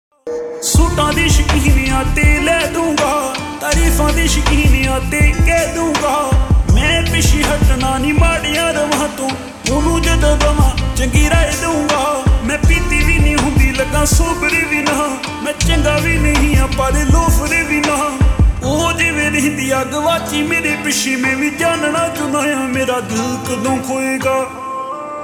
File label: Ringtone Slowed Reverb